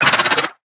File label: damage; Barotrauma; Hammerhead